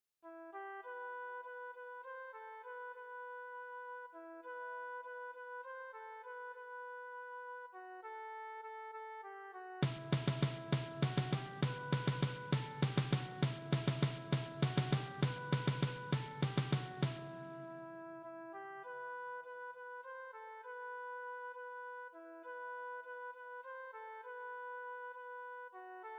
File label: PERC; Tararán